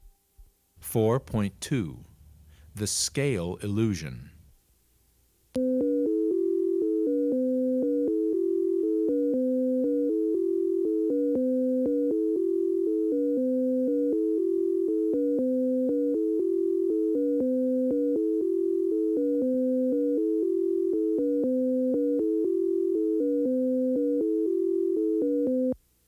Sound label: Jingle